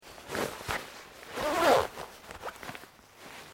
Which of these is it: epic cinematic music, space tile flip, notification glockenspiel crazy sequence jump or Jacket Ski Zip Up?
Jacket Ski Zip Up